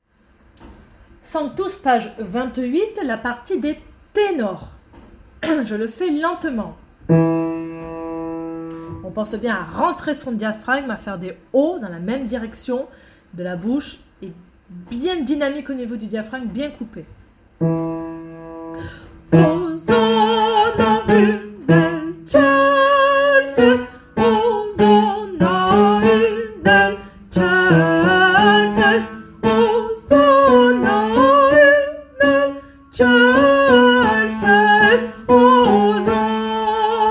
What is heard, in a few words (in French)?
ténor lent; Sanctus p28